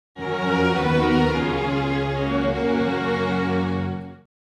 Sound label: Exploration - Attribute Gain; Heroes of Might and Magic II